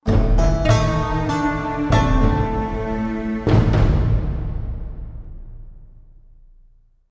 Defeat

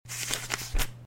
CRAFTING SUBPAGE; out of reach treasure royale 2020 gamerip pc

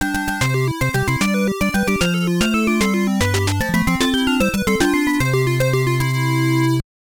Victory; Ai Senshi Nicol; FDS

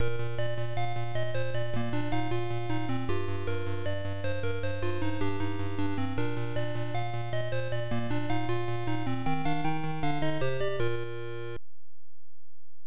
All Clear; Lode Runner; arcade; Irem M62

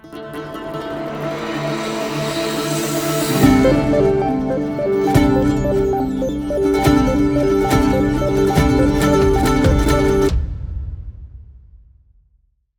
Transition; entwined; ps vita

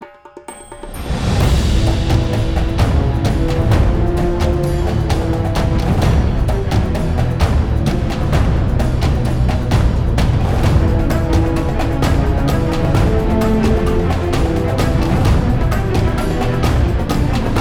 Combat Stinger; Combat Stage1 Front Stinger

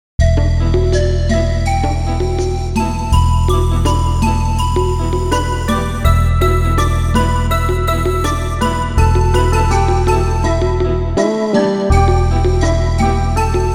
Tavern; Saiyuki Journey West

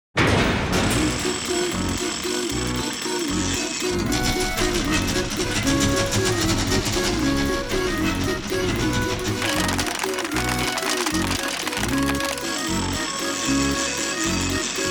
Accordion Lower; Armikrog; Wii U gamerip 2016

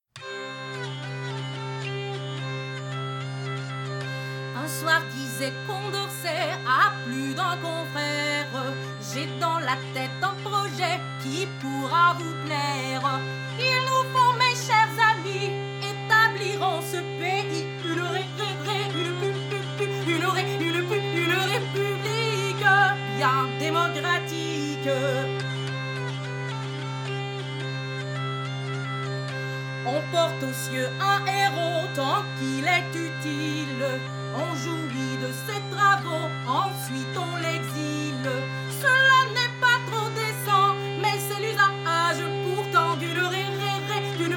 Tavern; assassin s creed unity gamerip 2014

